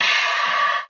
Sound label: "ah" exhale; Voice; ooh ahh